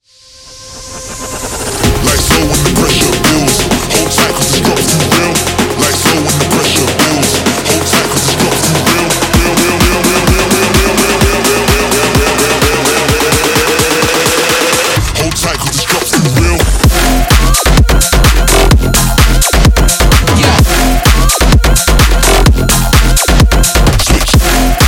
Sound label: Construction Kit Full Mix